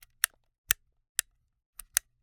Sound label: Camera, Kinusa K77, 50mm lens, plastic, serrated, clasp, flip, various; Eiravaein Works; Vaeyan IV